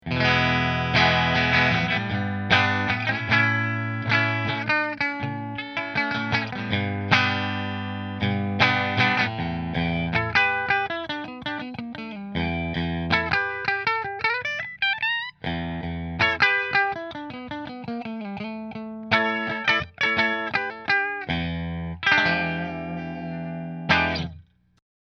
Driftwood Bridge Fender

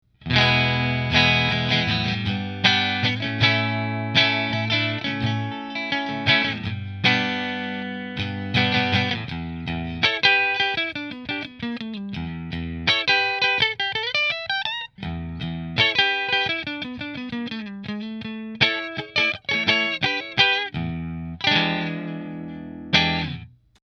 Rahan Position 3 Fender, guitars; Amber Burst

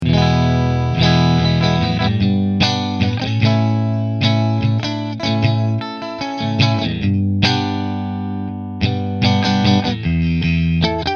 Nola JB14 Middle Middle Fender, guitars

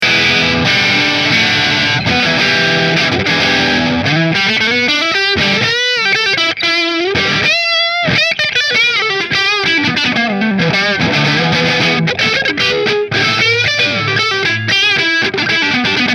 Tokai Goldtop Neck Marshall, guitars

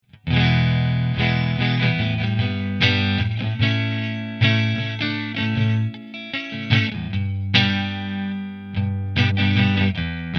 Italia Position 3 Fender

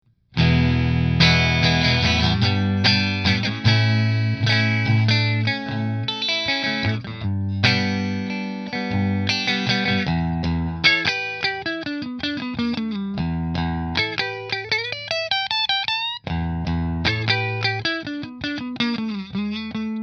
Fender Position 3 Fender

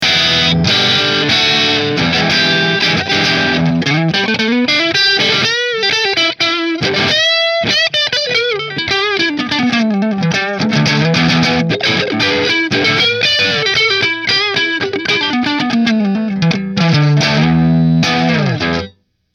Nola JB14 Custom Neck Marshall, guitars

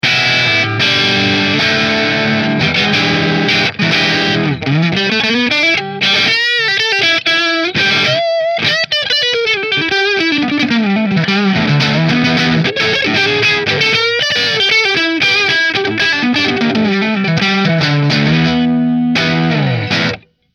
Parker Neck Marshall

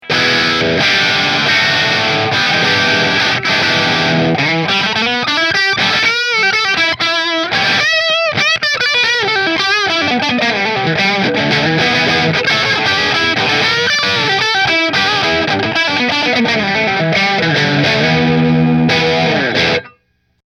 cherry Monaco Bridge Marshall; Hamer

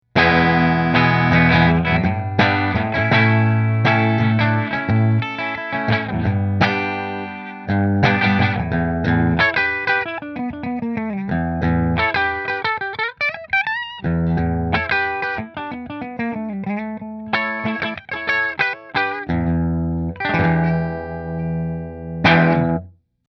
Nola JB14 Custom Bridge Fender, guitars

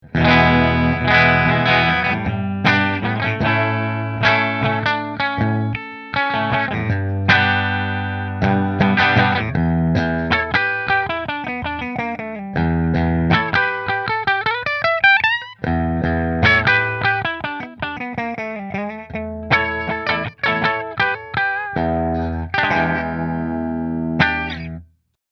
Nola JB14 Flame Bridge Fender, guitars

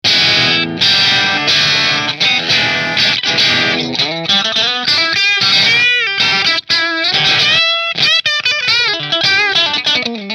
Partscaster Position 5 Marshall, guitars; Fender Partscaster Stratocaster Custom Relic

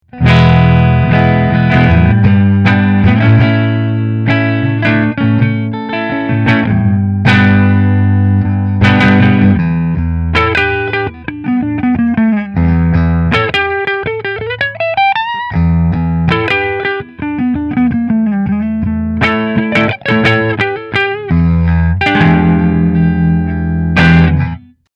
Hamer Aztec Gold Neck Fender

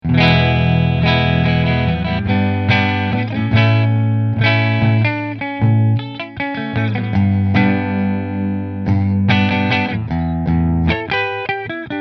McNaught Middle Fender, guitars; McNaught Grey

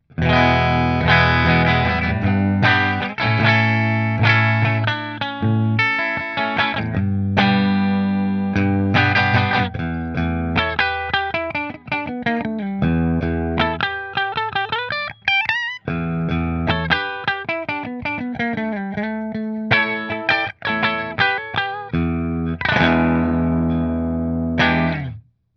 Nola Model 8 Bridge Fender, guitars; New Orleans Guitars Model-8 Natural Finish

